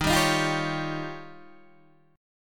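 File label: E flat 11th E flat, down, Guitar, DADGAD; strummed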